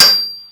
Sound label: Pick Hit, Shatter Stone; NV3D